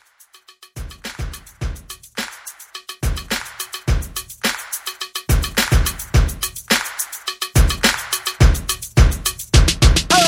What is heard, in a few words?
Old School Re Drum